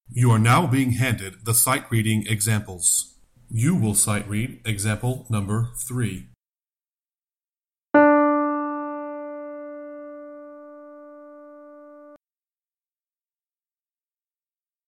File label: Sightreading Example Alto